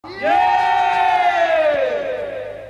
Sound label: yeeee